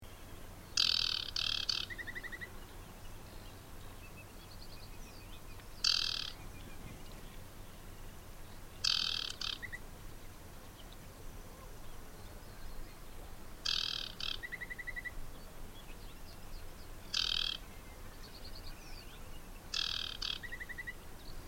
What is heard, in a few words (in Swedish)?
Vattensangare